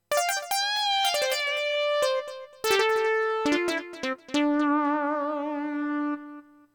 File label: Z1 Samples lead